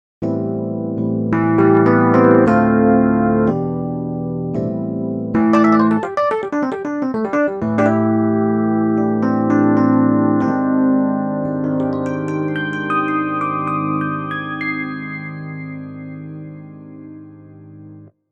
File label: Yamaha Rhodes